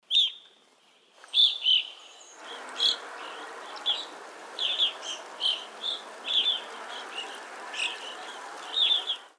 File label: GROSBEAK EVENING; species/birds; wbba